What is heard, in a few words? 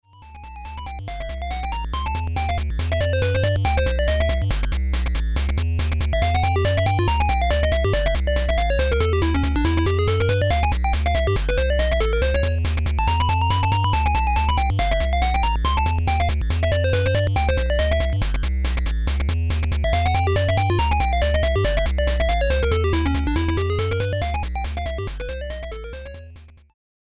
swing